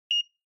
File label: Ticket Gate Beep Tone2